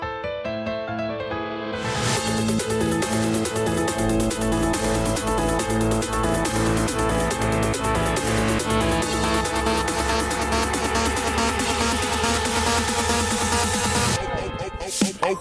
Turkish Mozart Trap

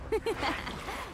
laugh; Nami

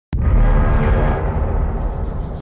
Message; BLACK WEAPON

